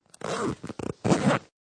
Zipper Zip Down Then Up; Zippers; Objects

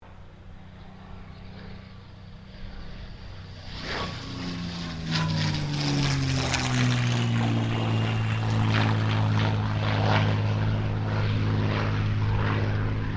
AIRCRAFT CA-18 MUSTANG PASS; P51 /CAC-18 Mustang; Airplanes; Vehicles

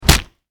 Impact Punch Face Hard; Punches and Hits; Combat

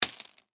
Impact Stones Single, Drop, On, Pile; BlastWave

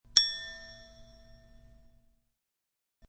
Single note played on a xylophone; Mallets; Musical

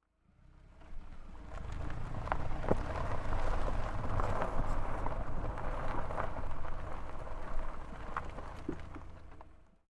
Car Tire Onboard Gravel Dirt Roll Slow Short; Cars; Big Room Sound

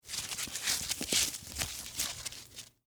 Rope Untie Knot, Heavy, Rope; Hand Tools

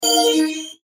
Chime Multimedia; Web Interactive; Chimes (Sound Effects:Multimedia); Blastwave; Technology

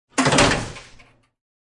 Closing a broiler.; Food Prep; Restaurants; Close Broiler

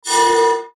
METALLIC FAST, Metallic Fast Attack

